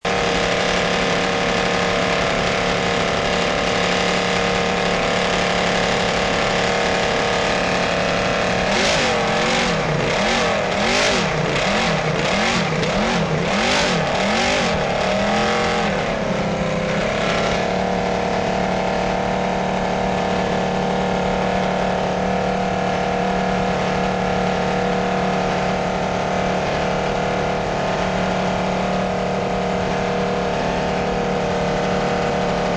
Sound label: guzzi v8; goodwood 2002